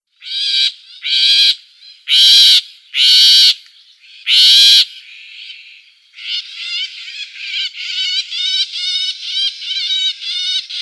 Great Grey Shrike nestlings

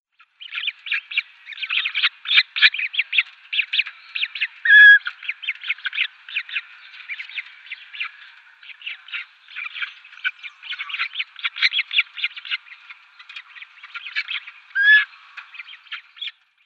Purple Swamphen downy Young

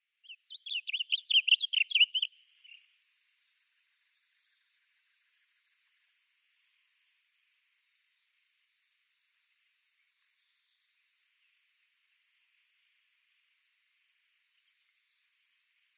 Blue Grosbeak song

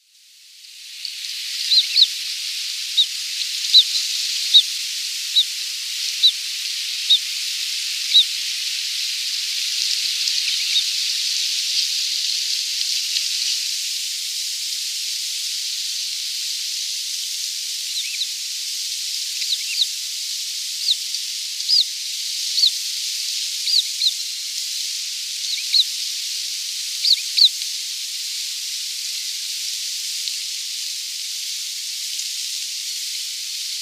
Ural Owl downy Young

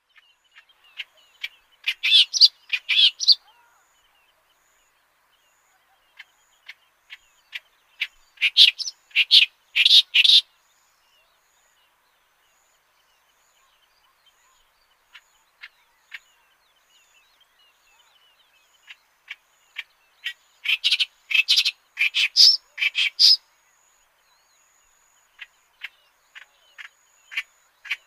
Clamorous Reed-Warbler song

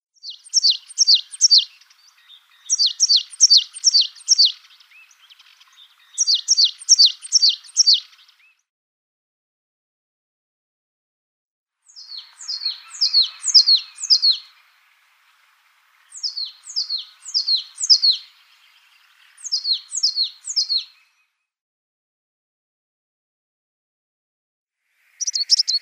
African Blue Tit ultramarinus song

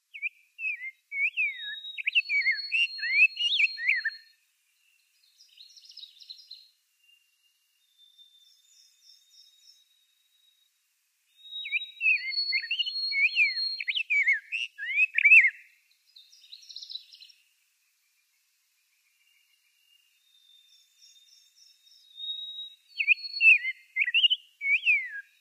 Rose-breasted Grosbeak song

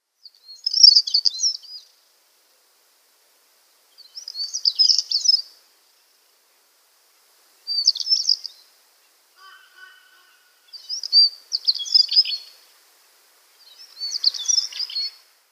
Canary Island Stonechat song

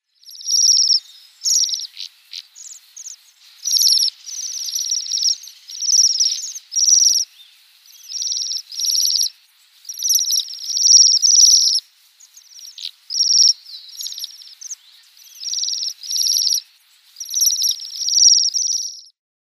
Bohemian Waxwing calls

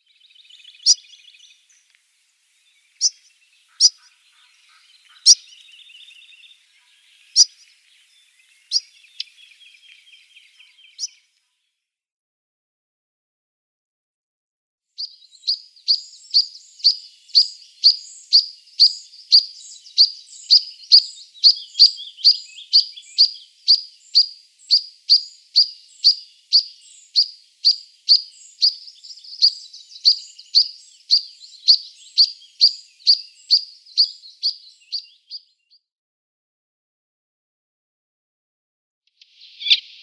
European Pied Flycatcher calls

